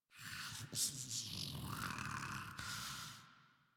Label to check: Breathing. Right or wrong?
Right